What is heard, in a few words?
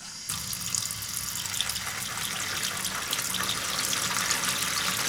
FAUCET, KITCHEN; AKAI S-Series CD-ROM Sound Library VOL-6